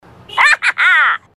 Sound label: hahaha; Kittens